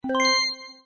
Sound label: warning